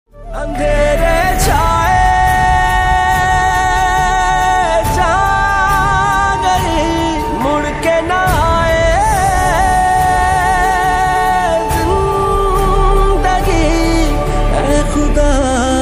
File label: Ost pakistani ringtone